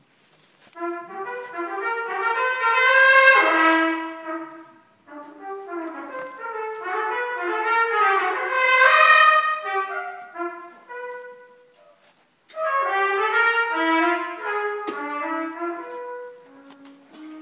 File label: trumpet solo